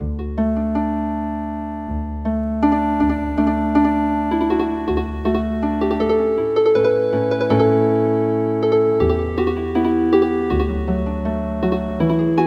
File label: Healin Harp